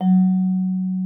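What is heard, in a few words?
vibraphone F#4; Xsample VOL-4; VIBES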